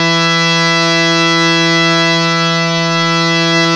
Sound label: HARMONIUM, World Instruments; Best Service ProSamples vol.52 - World Instruments 2; AKAI